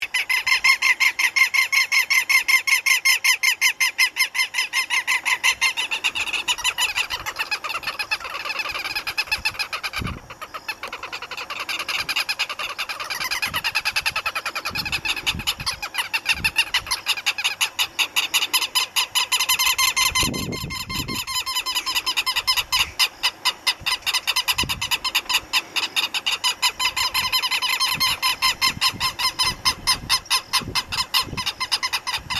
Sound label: southern lapwing